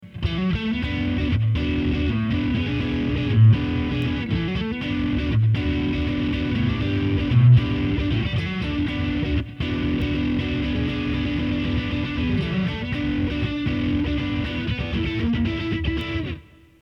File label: sidekick drive; stomp